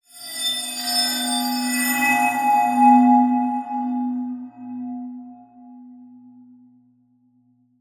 Production Element Title Transition Crystal Element